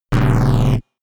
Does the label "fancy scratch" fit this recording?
No